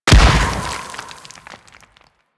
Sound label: Tree Slam Explosion; Enemies; Abilities; Warframe; Teralyst